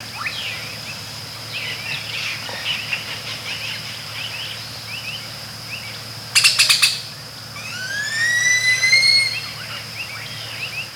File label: Belize Jungle, ambience gnarled forest